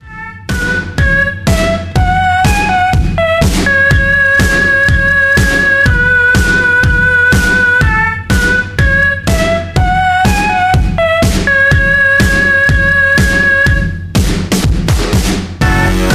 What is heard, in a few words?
instrumental